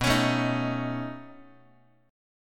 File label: B 9th B down Guitar; B9 strummed; JGuitar